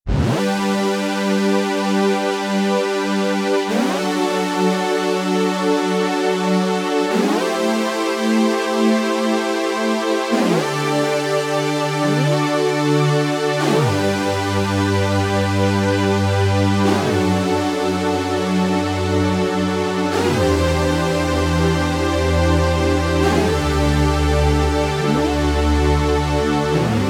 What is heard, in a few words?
Trident, Korg Radias-R